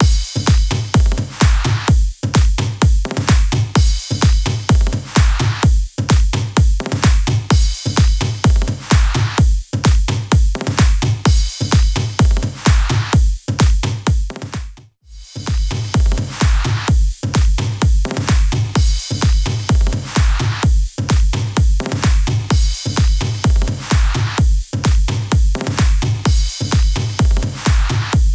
edm; phone translator

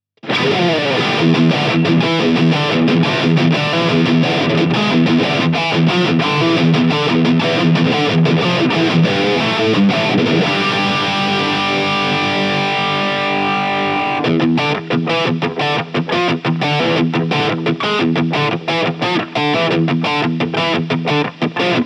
Engl R606 Ironball Head High Gain

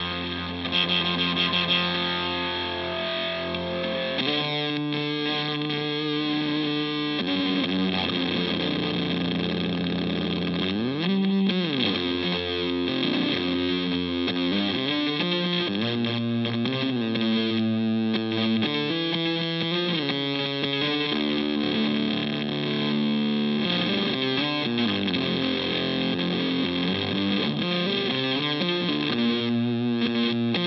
Headrush MX5 Amp Fuzz

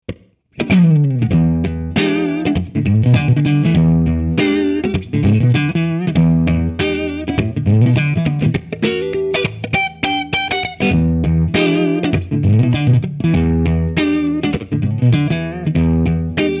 Electro Harmonix 5MM Clean

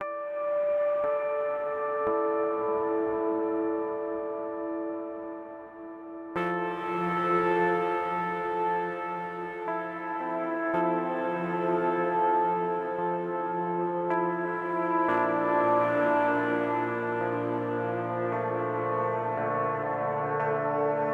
Eventide Blackhole Large

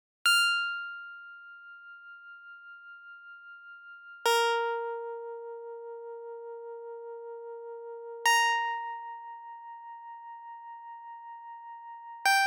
U No LX Bells C1 C1C2 C2; TAL

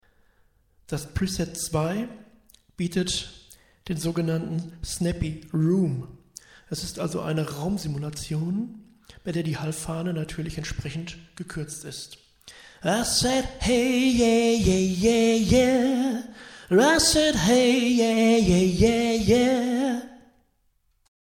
Preset Room

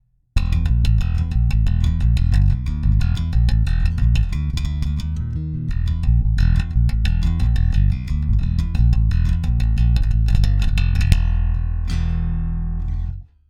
Both active extreme

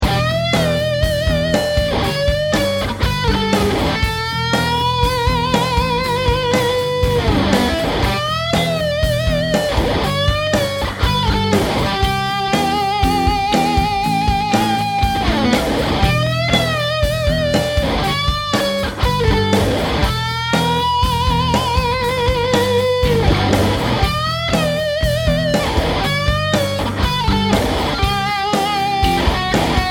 Lead Boost

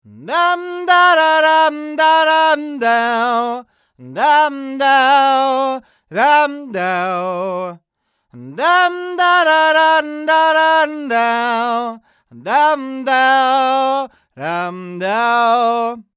Neumann TLM102 S2 far

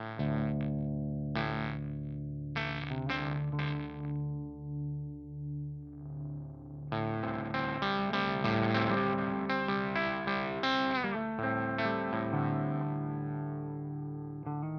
Ripped Speaker Fuzz completely f ked; EHX

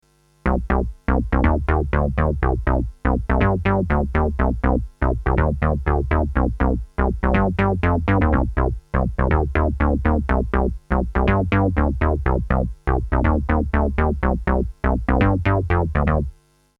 Boss CE-300

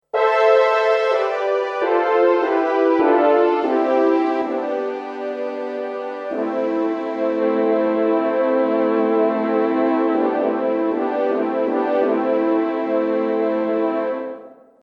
Artiphon ORBA 2 Bright Horns

